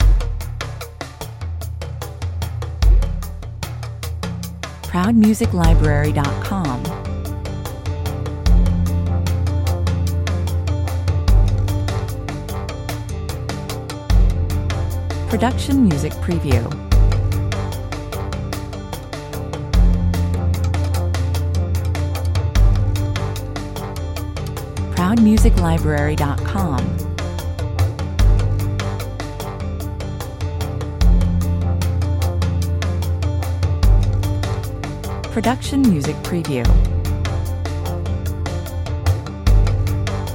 Perkussion mit Bläserflächen für zwischendurch, 148 bpm

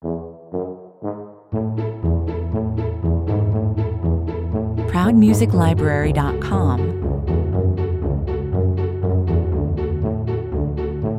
lebhaft, glücklich

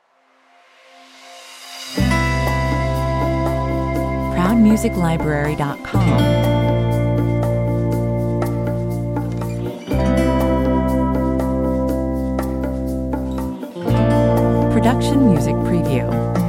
121 bpm, Moderner Lounge-Bossanova mit edler Meeresatmosphäre; Toll für Urlaub, Wellness und schöne Erinnerungen.

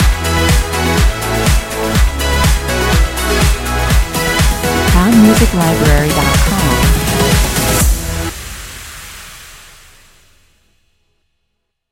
Eine einfache, fröhliche Melodie mit einem unwiderstehlichen Dance-Pop-Tempo

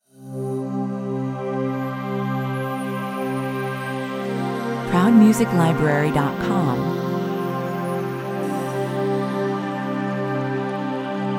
Perfekte Einleitung für Dokumentationen und Imagefilme – sphärische und getragene Sounds, 120 bpm

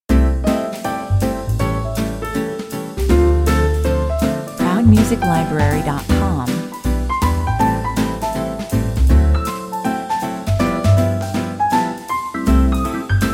Fröhlicher Sonntagnachmittag in einer amerikanischen Kleinstadt, nette Nachbarn, 160 bpm; liebenswerte Kinder und das ganze noch im Schwarz-Weiß der 50e

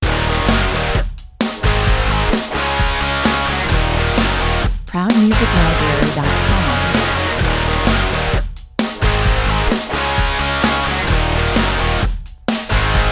Solide und mit klarer Aussage. Rock it Baby…, 130 bpm

